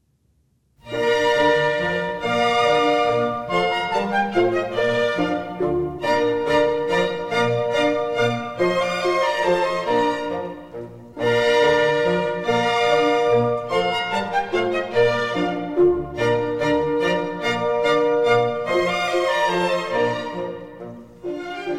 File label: German Dances, Bb major; Mozart; Dances and Marches